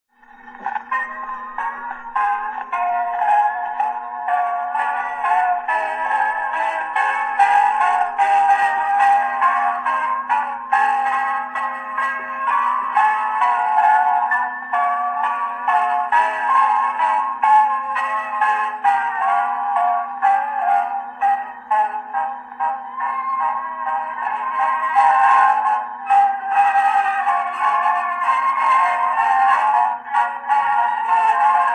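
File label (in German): G'schichten aus dem Wienerwald Orchestre Tzigane Waltz, Nirona Modell Suzy; Waltz by Johann Strauss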